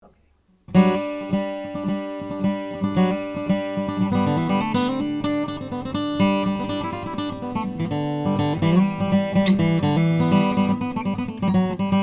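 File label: bourgois sj varnish madagasar rosewood, guitars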